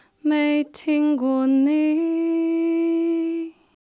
Alto

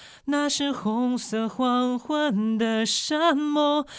Tenor

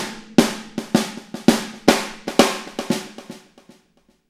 drums; RemFx

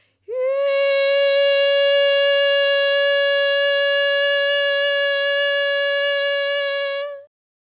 Soprano

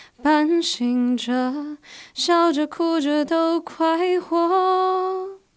Alto

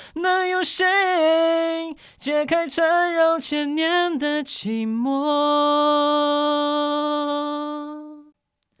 Tenor

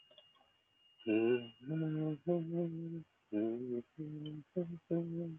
Human sounds Human voice Humming, vocal imitation

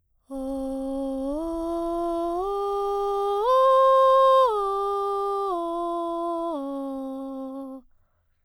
arpeggios breathy o, vocalset

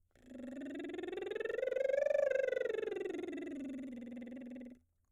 scales lip trill e, vocalset

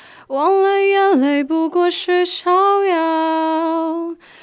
Alto

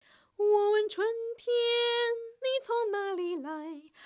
Soprano

